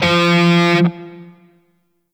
Shawzin Oct Two F; Warframe; Grineer Shawzin

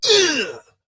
Pain; Warframe; Dax Ashigaru; Battle Barks

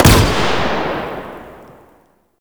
Rifle Shot C; Warframe; Zariman Grn Wraith Rifle

